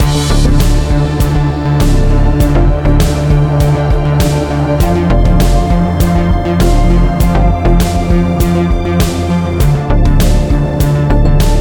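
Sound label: Battle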